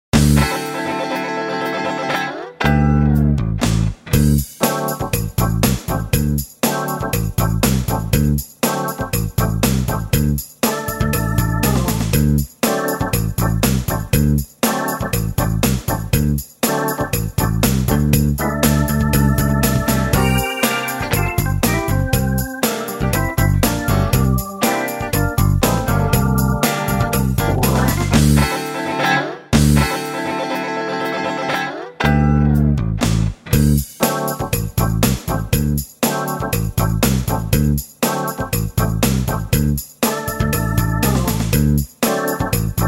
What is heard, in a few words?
JAZZY